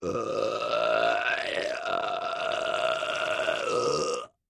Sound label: Lng Articulated Be, belching